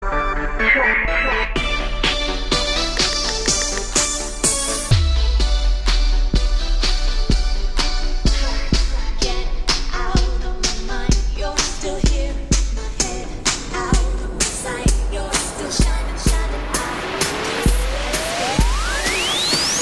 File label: Samsung Galaxy A50